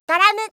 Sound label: drums